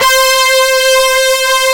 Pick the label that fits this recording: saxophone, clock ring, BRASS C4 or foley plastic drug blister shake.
BRASS C4